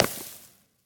Clap; Smite; god Kumbhakarna

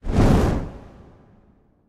fire; smite; hades

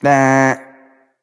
nv tongue; smite; hachiman

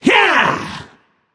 yay; smite; ahpuch

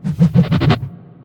projectile; smite; chaac

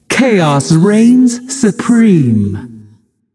"yay"; smite; voicepack; nuwa

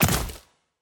movement run; smite; vamana

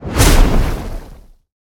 explode; smite; god sol

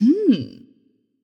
nv thinking; smite; voicepack; morgan le fay

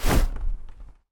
wing flap, manticore; smite

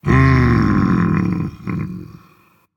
thinking, dragon; fafnir

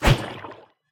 swing goad; smite; god ganesha skin11

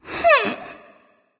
nv sigh; smite; awilix

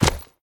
pet footstep; smite; lancelot

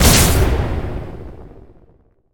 projectile dmg; smite; raijin

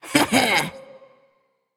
hehheh; smite; jingwei

